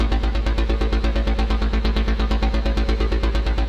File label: Synth Pulse; Serial Chiller